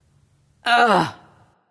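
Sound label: ugh, female; smite; voicepack; hera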